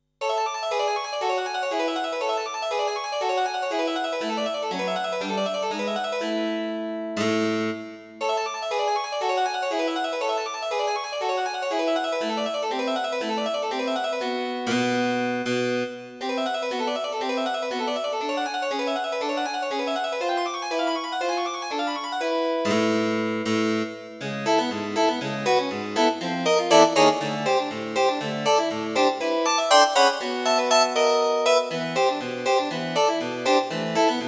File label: Harpsichord Suite Toccatina